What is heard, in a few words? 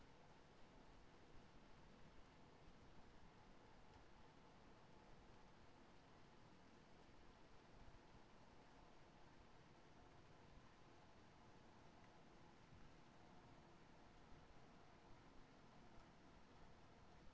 HYDROPHONE 16000Hz; JASCO AMAR HYDROPHONE 740